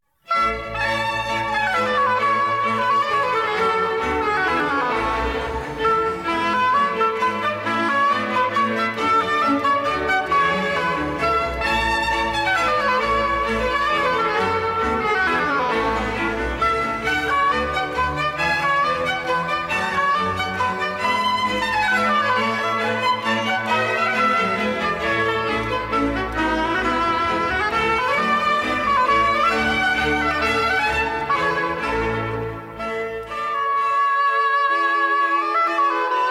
Oboe d'amore Concerto in A Major III. Allegro ma non tanto; BWV 1055